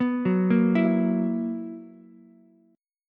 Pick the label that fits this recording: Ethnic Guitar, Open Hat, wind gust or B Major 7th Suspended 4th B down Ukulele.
B Major 7th Suspended 4th B down Ukulele